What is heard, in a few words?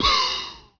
poof; BESTSHOT; noisybit